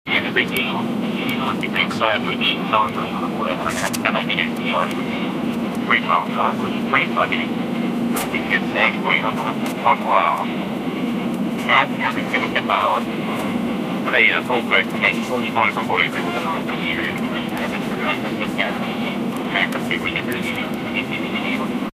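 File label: old cockpit voice recorder, cockpit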